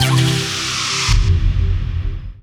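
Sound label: synth, Production Effects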